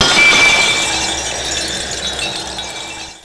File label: glass